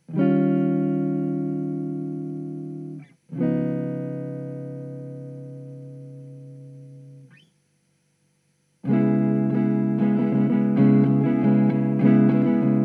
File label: buffer front clean